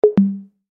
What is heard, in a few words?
Chime Musical, Chime Musical Multimedia